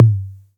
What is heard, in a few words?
Tom; Ableton Live 12 Lite; Core Library; Drums